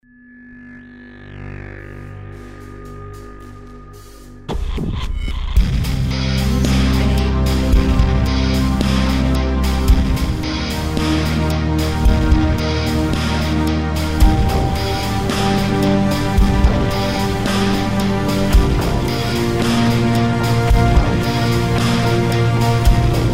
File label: Cinematic Action Intro